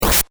attack sword

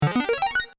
gyuin

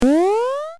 jump long

